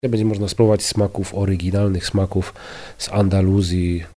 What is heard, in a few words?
Flamenco